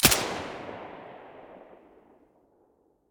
silencer stereo, weapons

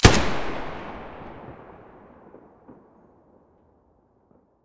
muzzle galil stereo, weapons

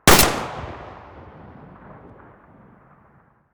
muzzle hpsa mono

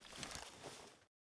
torch use; STALKER; IWP; item usage

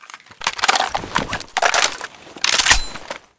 saiga reload empty, weapons

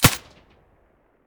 silencer; S.T.A.L.K.E.R.; IWP; vityaz; pp1901

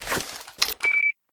draw, detectors; veles; STALKER; IWP

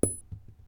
shell 39mm carpet; STALKER; IWP

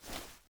detector draw, weapons; STALKER; IWP

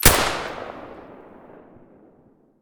silencer sv98 stereo

